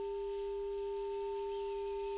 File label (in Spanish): Multiarmonicos